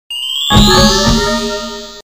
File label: animal transformation; go diego go; safari